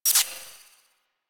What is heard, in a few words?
Gear Wheel Disabled; Warframe; Dark Sector